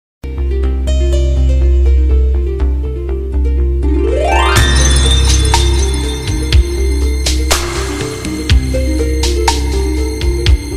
bollywood song ringtone

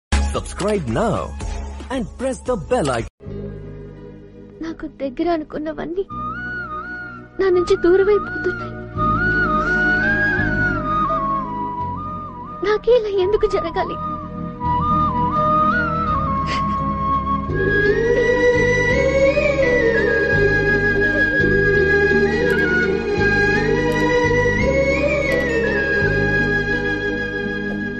love failure new bgm ringtone love sad; bhadra; ravitejafans

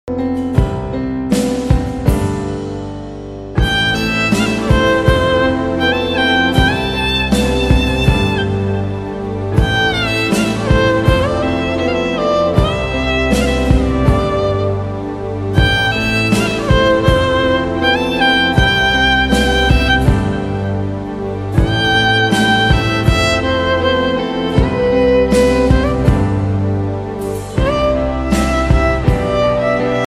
song instrumental bgm

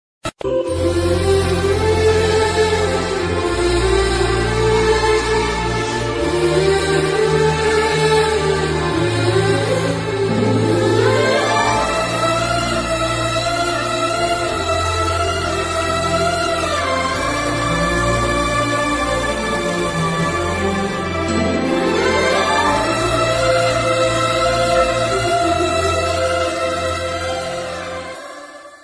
telugu bgm ringtone, south indian bgm ringtone; athidi movie; ms reddy bgm

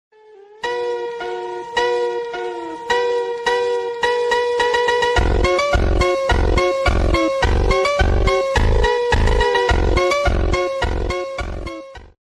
sigma ringtone attitude ringtone

